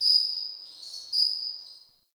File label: Cave Ocean Creature Far, Ambience, Ocean Cave; Midrath Island